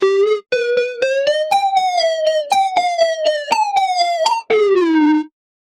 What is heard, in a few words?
uplifting beat with drums